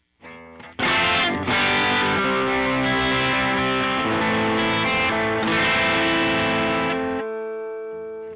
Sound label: guitar CLEAN SUBTLE; SKYE Clipper